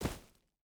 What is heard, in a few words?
footstep barefoot gravel jump land; Rust